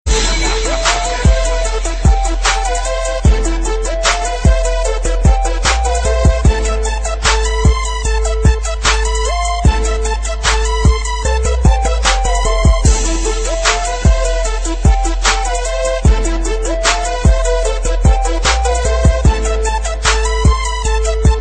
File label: hiphop music